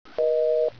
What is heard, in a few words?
dead tone